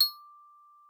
TRIS D6, VIBES; Xsample VOL-4

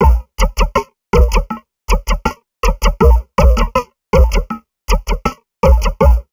Drum Loops Crazy Processed; USB Soundscan vol.07; AKAI

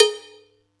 Yamaha EX5, Drums

